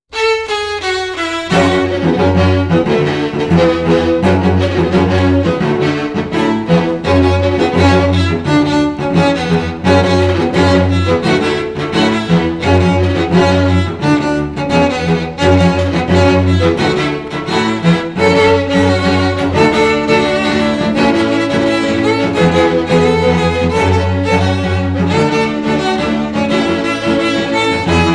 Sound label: string quartet